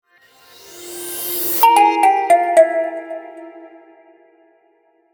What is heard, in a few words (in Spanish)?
AUDIOLOGO; EXMA